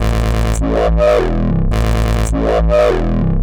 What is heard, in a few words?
140 A BASSLINE, Dubstep